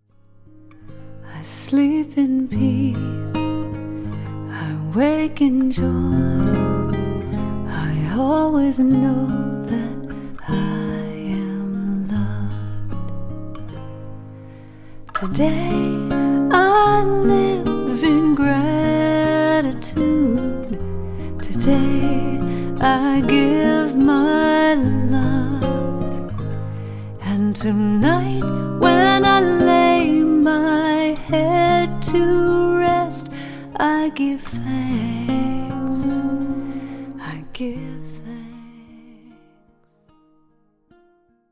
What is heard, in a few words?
Sleep Chant; sleep in peace wake in joy